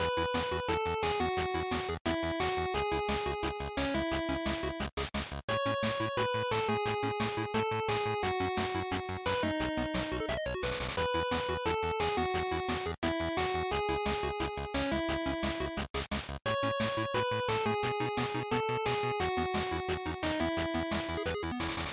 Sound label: GB Fighting ED2 Loop175